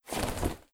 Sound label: Clothes Drop